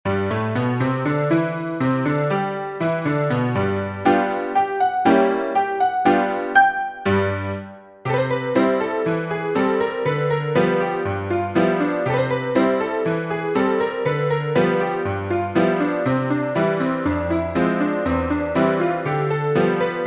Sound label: PIANO